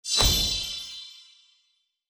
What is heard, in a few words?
Magic Score; HintsStarsLite